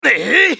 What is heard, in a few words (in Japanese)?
攻撃; イェガネー